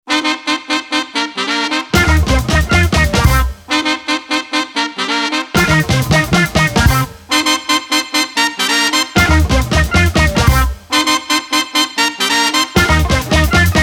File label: ska orchestra